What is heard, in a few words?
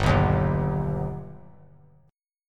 G Augmented G, chord; G+ strummed; JGuitar